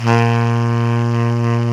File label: SAX TENOR, Roland Super Sax; Roland LCDP07; Tenor V-sw; Tenor 3way